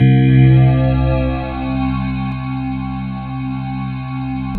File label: World of Synthesizers, SYNBELL